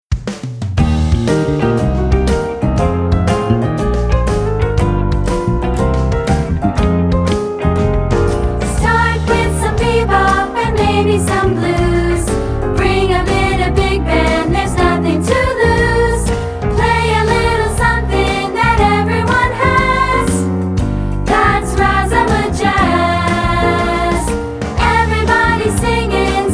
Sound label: Vocals